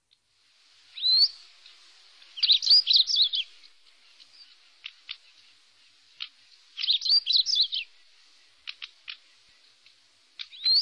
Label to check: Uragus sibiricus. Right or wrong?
Right